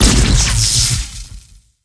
machine dead, monster

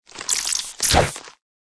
mantis attack, monster

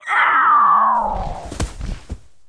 birdman death, monster

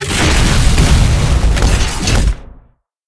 boss dreadnought satk; steamer crazy

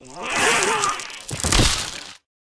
plant troops dead, monster

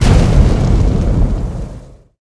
gate fire attack

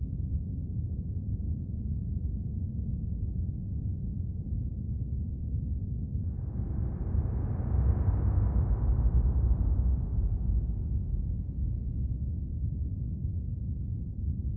empty ruin; forgottenruin

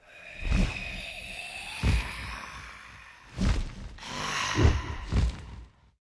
arch lich idle, monster

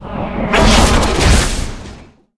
attack

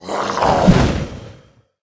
vampires attack

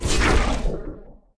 damage, monster

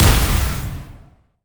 Mech Griffin explo; monster

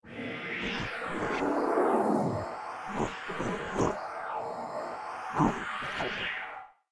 guardian idle, monster; legacy guardian

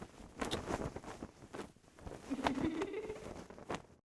succubus idle, monster

